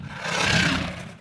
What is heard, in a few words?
Crocodile Attack; Playable Tutorial Level